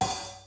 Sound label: half hat